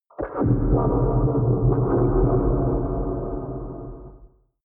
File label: wobble scrape, drones